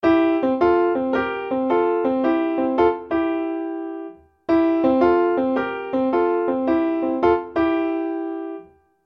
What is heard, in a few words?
Right hand 2 bars in C, piano blues